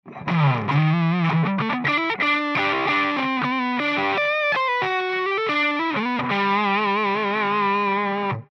split tone verzerrt; Gitarreneinsteiger; Einsteiger Sets

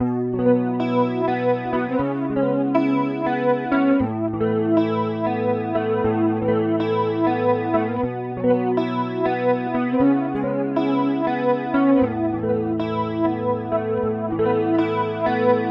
kammfilter flanger

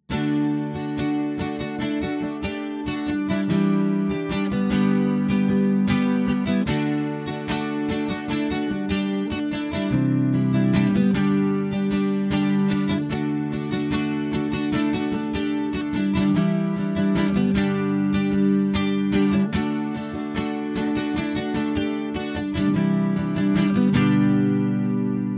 di acoustic 66er guild f 212; Line 6 Variax JTV-69

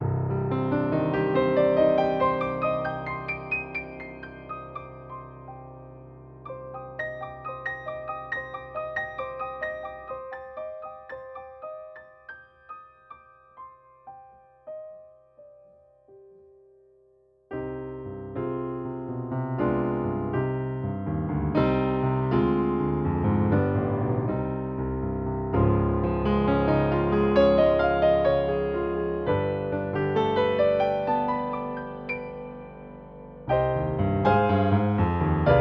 kawai novus n5 klang

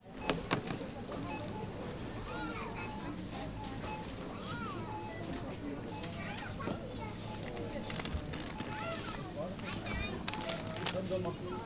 Supermarkt; tascam dr-22wl

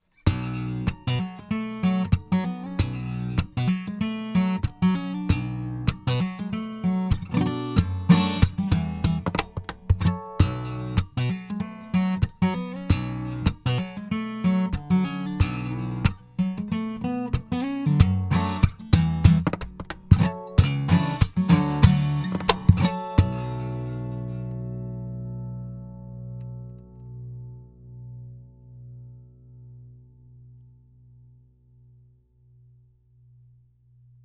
tascam tm-180 gitarre

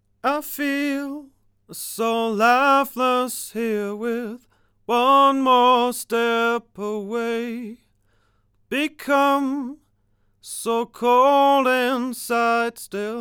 VT-12 Gesang; fame pro series

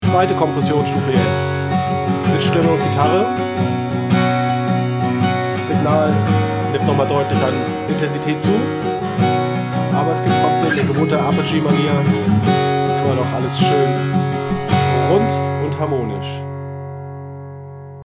instr stimme kompr; apogee hypemic

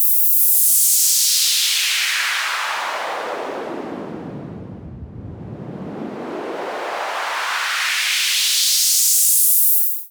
moog multimode filter xl rauschen band pass mittlere resonanz; universal audio

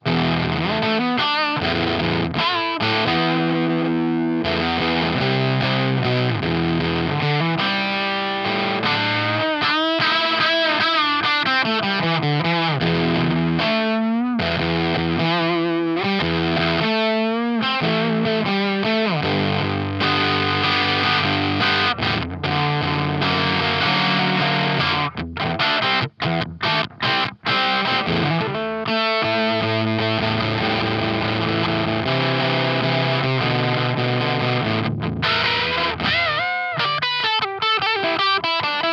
fuzz test 12-uhr-einstellung

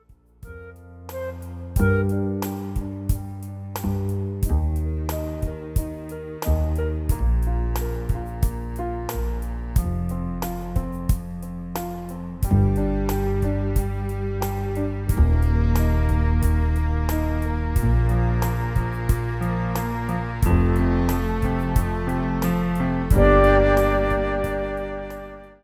Akustik-Ballade; songwriting

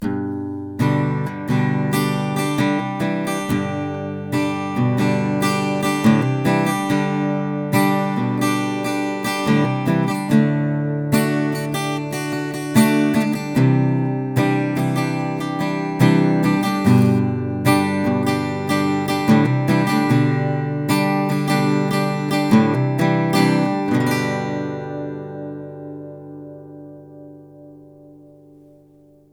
Audio-Technica AT2031 direkt Schallloch

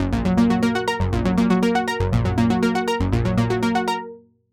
arpeggiator oktaven 4

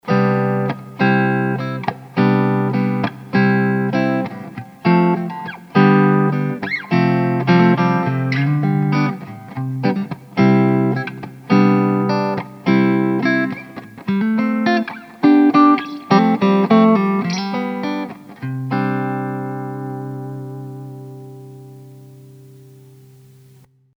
strat hals clean; harley benton mighty-5th test

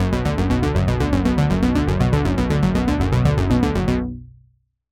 arpeggiator laufrichtung up

